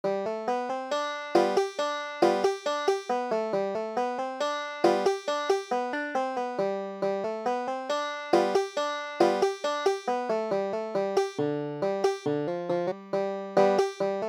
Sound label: garfields blackberry blossom, banjo